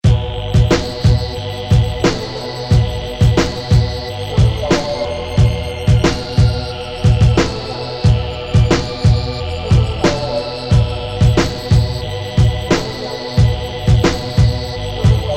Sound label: stupid riff